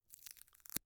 Tape Scotch Tape Pull Hand From Sticky Side, Household